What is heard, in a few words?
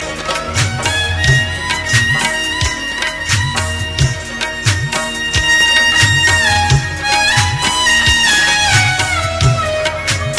pashto sarinda